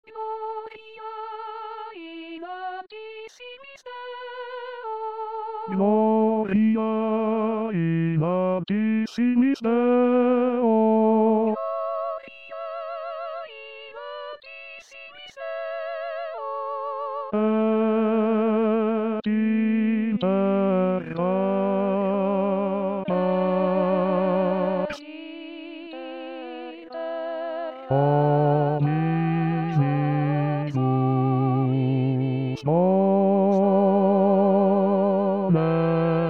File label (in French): oratorio de noel basso